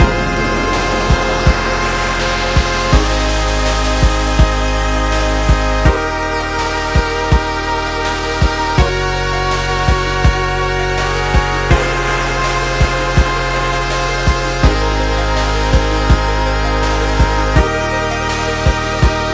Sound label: Gameover; Iron Meat; PS4 PS5 Switch Windows Xbox One Xbox Series XS gamerip 2024